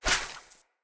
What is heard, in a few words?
lantern net break; Objects; Ode Lantern Crate